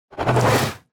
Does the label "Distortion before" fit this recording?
No